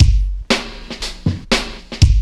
LOOP, DRUM; E-Lab; X Static Goldmine 2; akai

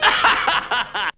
TOON

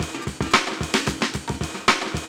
LOOP; E-Lab; X Static Goldmine 2; akai

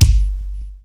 BD THAP; E-Lab; X Static Goldmine 2; akai

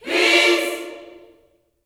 PEACE chord, Gospel; Spectrasonics; Vocal Planet Vol.1 Gospel; akai

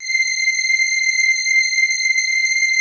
PAD, ATMO PADS; Best Service; XX Large

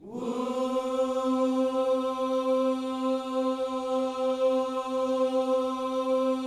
WHOO C 4, Vocal Planet Gospel; Spectrasonics; Vocal Planet Vol.1 Gospel; akai

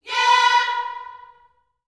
"yeah", gospel vocal, B4; Spectrasonics; Vocal Planet Vol.1 Gospel; akai

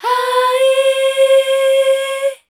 MEL C2, Voice Spectral, MELODIC; Best Service; Voice Spectral II

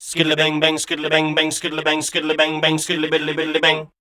SCAT #D

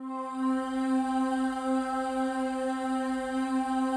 PAD, ATMO PADS; Best Service; XX Large

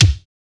BD; E-Lab; X Static Goldmine 1

